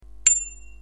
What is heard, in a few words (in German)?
Fahrradklingel XXL; Easy